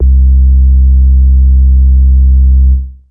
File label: Bass Synths hits and octaves